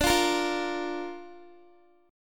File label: D Minor Sharp 5th D Guitar; strummed; x,17,17,15,17,x; 4F,4A,5C,5G,5C,6E; Dm#5